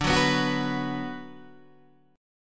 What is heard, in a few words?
D 6th D down Guitar Open D, chord